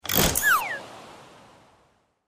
Zoom In; bad piggies; android; mobile; windows

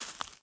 Foot Weeds Bare Walk

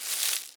Panel Storage Plant finish; Among Us

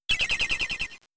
Light Screen; Pokemon; Gen 3; attack moves; RSE

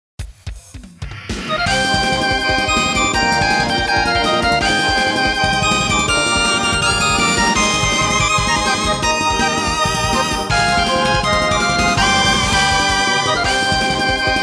MIDI Microsoft GS Wavetable Synth; Rosenkreuz Stilette; Fighting Spirit

